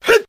huh, Voice

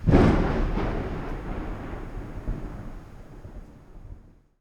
thunder

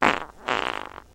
fart short bursts

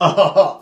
huh huh ho, voice; ooh ahh; heh huh

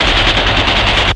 machine gun short; Crash Bang Boom

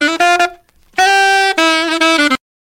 sax, Loops; Phonky

